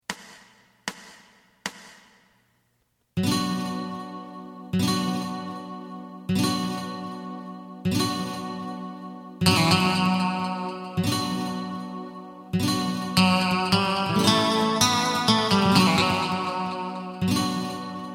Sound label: drum